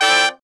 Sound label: Sect Chord Mj9; Roland BK-5; Loops & Phrases